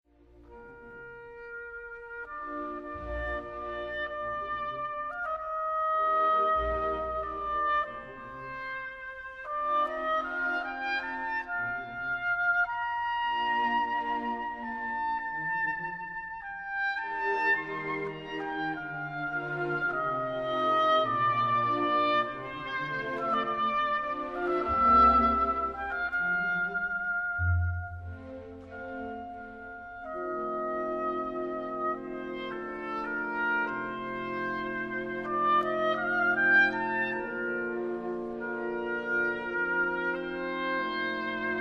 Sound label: Oboe